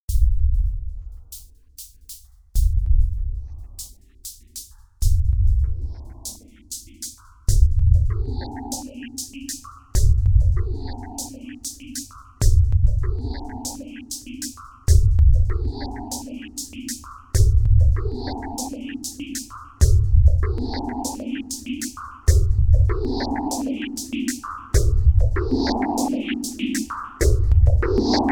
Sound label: noise groove; filterbank; spectralis